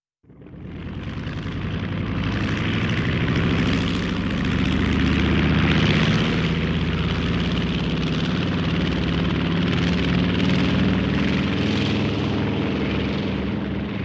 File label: Airplane - twin prop take off